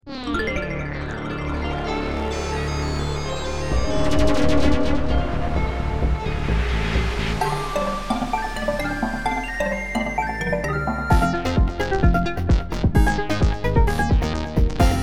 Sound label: multiphonics; thiago pinheiro; cornucopia